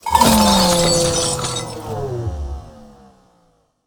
Recyclebot Death

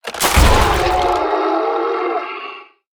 Painted Canvasaur Death

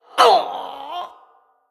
Female Vrykul Death

